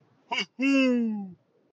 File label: woohoo; Slimeoos Odyssey; godot